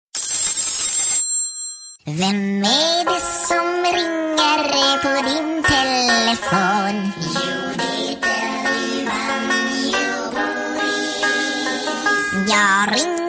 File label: banjo